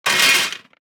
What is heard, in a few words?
DICE on Metal, Throw and Roll, Standard, 16 Sixteen Dice; Samples; ArticulatedSounds; DICE Sfx Party (Extended)